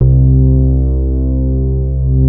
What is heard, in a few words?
warm bass C3; SE-1 BASSES